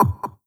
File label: Percs, Elektron MachineDrum SPS1 MKII